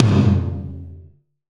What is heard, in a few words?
Tom, Gated Reverb; musicradar